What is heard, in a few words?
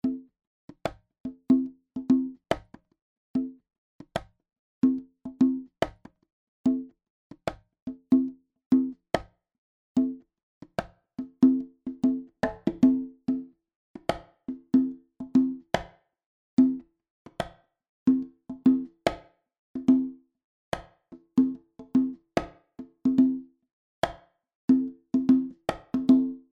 Conga Small Room; Yardstick